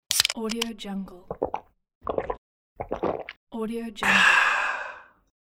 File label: drinking soda; aetherfx